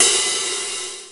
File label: Hihat, Roland S50 Cymbals; Drum Samples